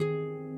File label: harp